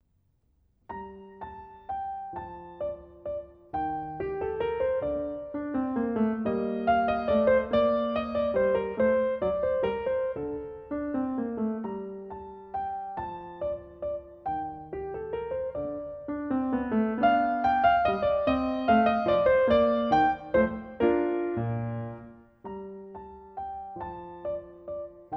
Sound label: Menuett in g Moll, piano; Notenbüchlein für Anna Magdalena Bach